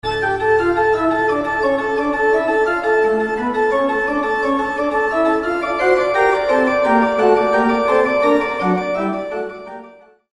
bach toccata and fugue in d minor; 1707; scary

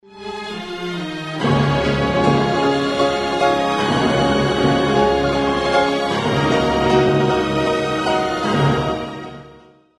tchaikovsky piano concerto 1; 1875

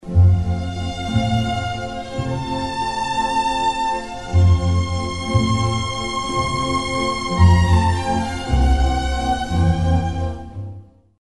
piano concerto; Mozart; Piano Concerto No. 21; 1785

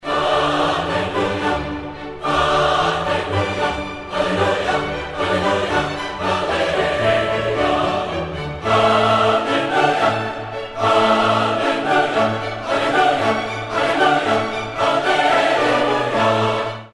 handel messiah hallelujah chorus; 1741; joyful

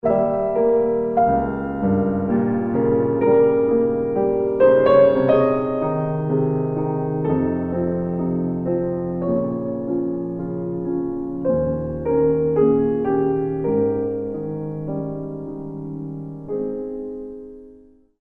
beethoven piano sonata 8 pathetique; Piano Sonata No. 8 (Pathétique): II; 1798; sad

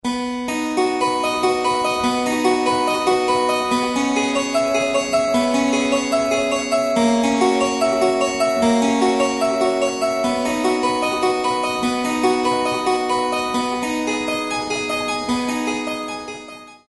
bach well tempered clavier prelude; No. 1; 1722; orderly

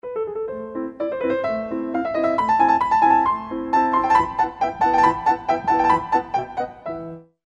mozart piano sonata 11 rondo alla turca; 1783

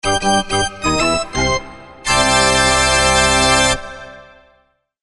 vaudeville seven note fanfare

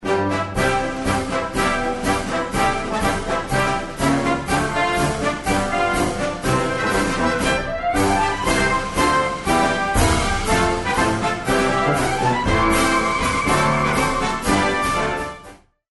army goes rolling along caisson song; Military - US Army

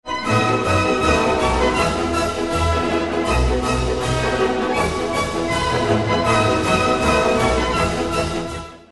strauss tritsch tratsch polka; 1858; cartoon

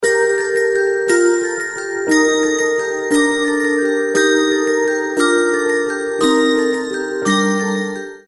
carol of the bells instrumental; leontovych

